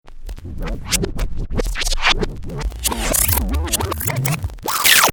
Turntable Scratching Reverse; Dj; Musical; Lynne Music

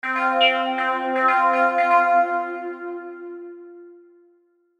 Logo Synth Filter; Reverb; Sonic Logo; Blastwave

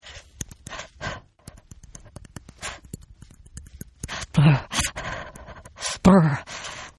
Shiver Teeth Chatter Female; Sick Sound Effects; Sickness Sounds; Human

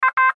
Radio Morse Code; Morse Code Letter A; Morse Code Sound Effects; Blastwave; Warfare

